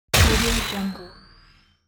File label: blaster shot